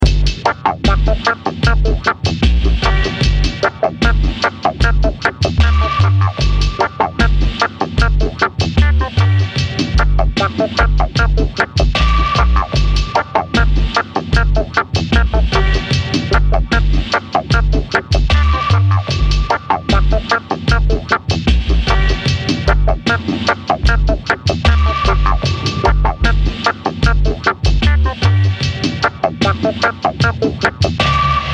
Minor Dub

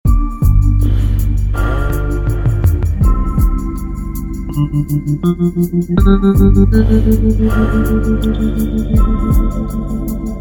Slowmo, dreamy

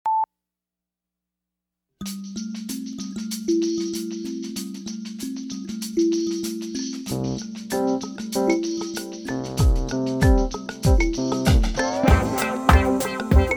Four on Floor

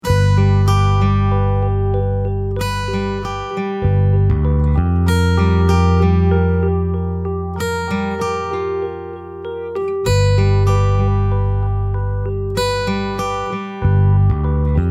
Kick

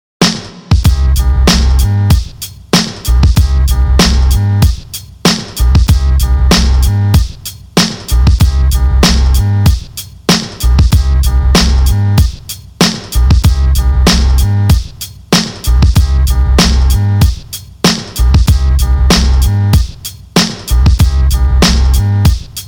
Blip

